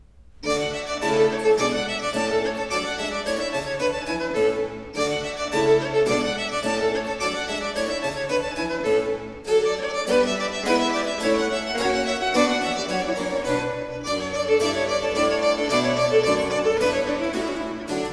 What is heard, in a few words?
Sonate 11 in E major 5. Gavotta, allegro; Arcangelo Corelli; Corelli - Complete Works; Klassika